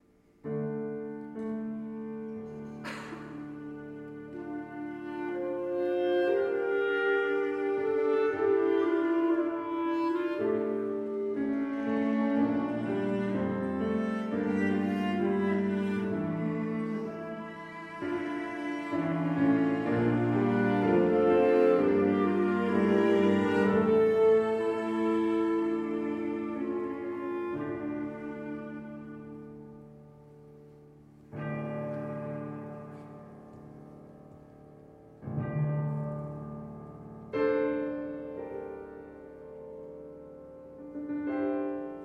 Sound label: Instrumental; Tuba Mirum; teatro pavon